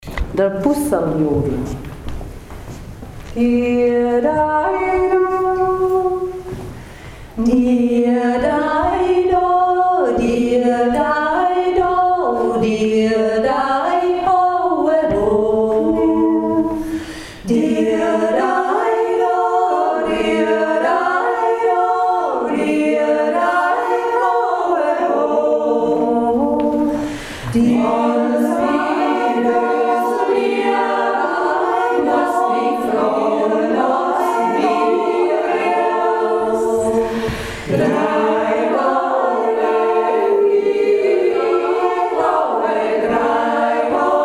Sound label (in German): dr busserljodler; der liebesjodler